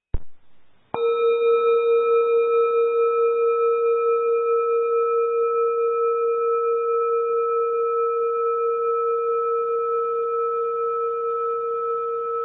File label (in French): Bol chantant si b